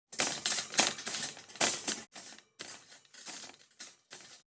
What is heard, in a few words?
Metal Cage Rattle Movement; Fairlight Sound Lib